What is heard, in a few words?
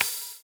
OH, Drums Dance & Groove; USB Soundscan vol.11; AKAI; NYORK KIT